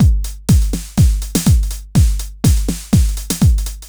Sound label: 123BPM DRUM, Drum Loops